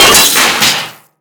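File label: metal panel; Explosions; modern warfare 2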